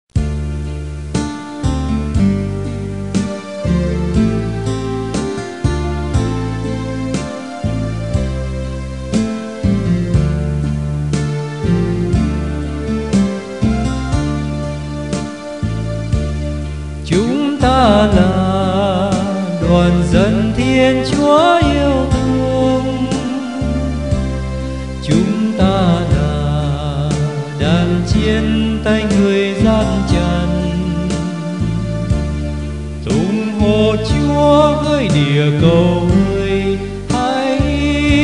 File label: ALTO